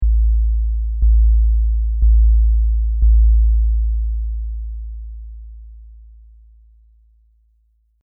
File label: sabbass only